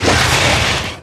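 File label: enemy attack sweep tail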